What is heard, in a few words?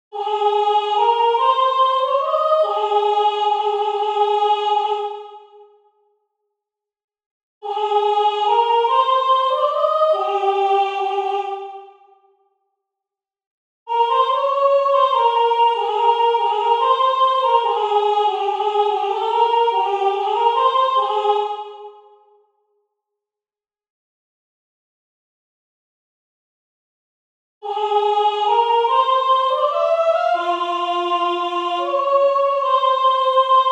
Kirie Soprano; Faure; Messe-Basse